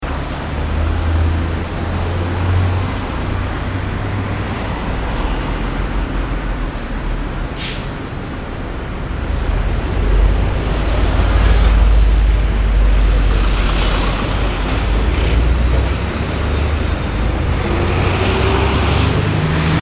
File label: car